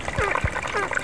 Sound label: ruffed grouse; pennsylvania